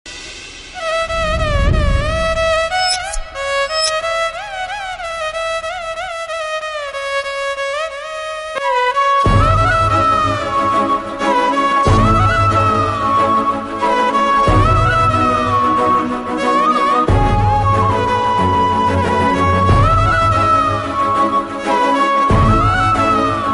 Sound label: Terrace True Love Sad Bgm Telugu; Telugu Private Songs